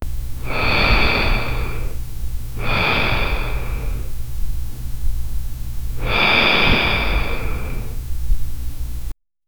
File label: the slow gentle quiet sou, asleep